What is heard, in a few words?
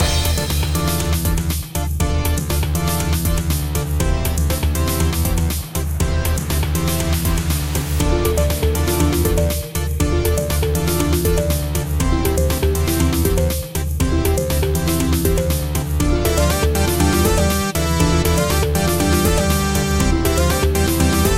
Marimba synth